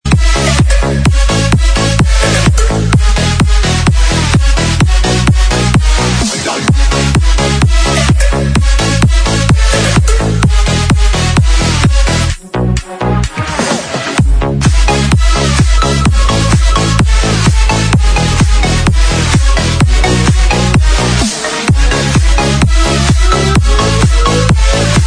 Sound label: RUMBA DJ